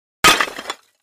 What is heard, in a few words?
Clay Chimney Tube Hit